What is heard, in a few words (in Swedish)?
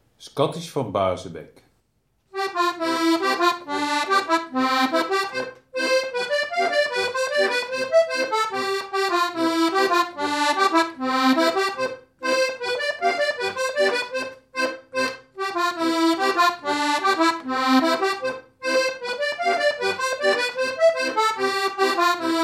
schottis fran barseback